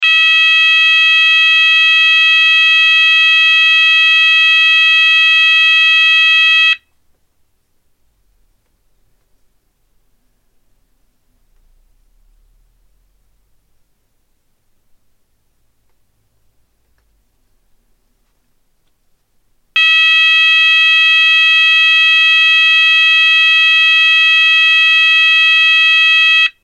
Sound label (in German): Ton